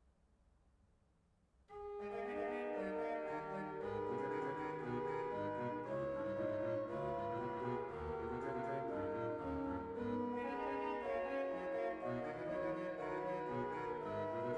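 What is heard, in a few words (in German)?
Sei gegruesset, Orgue; BWV 768; Freiberg; J.S. Bach – BWV 768 – Partita en sol mineur: Sei Gegrüsset, Jesu Gutig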